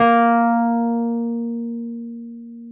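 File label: Wurlitzer electric piano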